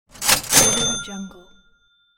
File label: cash register